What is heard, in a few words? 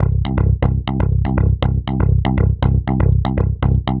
Rotate Bass, Bass Foundations; Sonic Foundry (Sony Creative Software); The Electronic Music Manuscript Collection